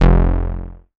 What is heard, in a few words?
bass F1, Oberheim OB-Xa